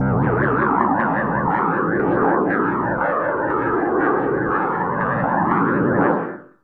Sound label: SPACCRAF; AKAI Anime Sound FX; SCI FI